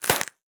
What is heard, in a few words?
impact; dota; marci